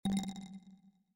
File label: tone